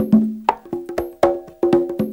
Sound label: CONGA LOOP, Techno Trance; E-MU Formula 4000 Series Vol. 2